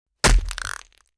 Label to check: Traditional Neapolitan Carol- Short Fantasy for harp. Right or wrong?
Wrong